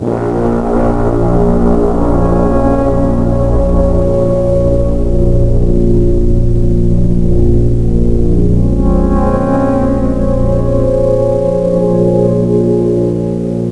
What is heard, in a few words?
Loop